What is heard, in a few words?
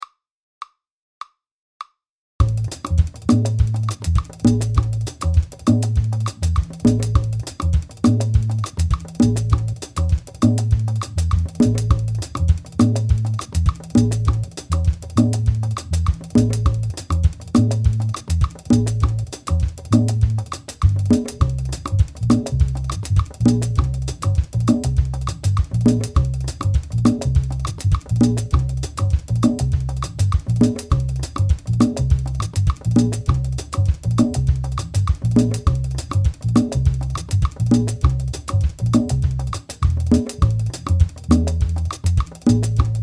Minor and Major Montuno